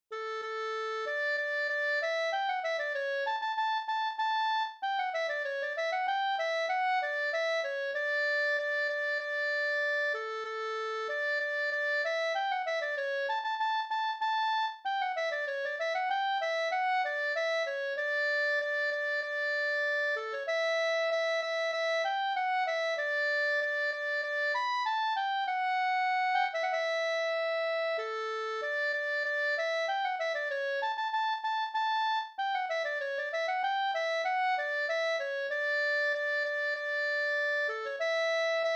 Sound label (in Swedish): ganglat marsch; e August Widmark Nr1